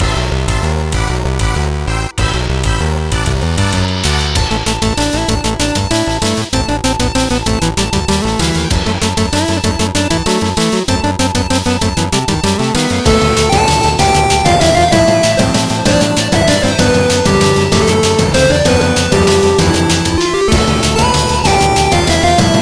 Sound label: Battle; SanctuaryRPG